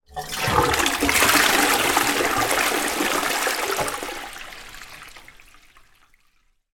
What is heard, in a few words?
household toilet flush short